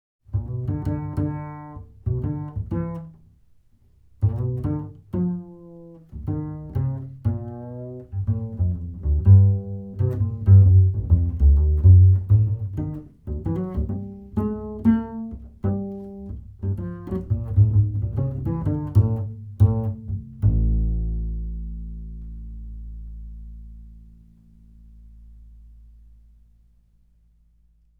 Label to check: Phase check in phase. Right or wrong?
Right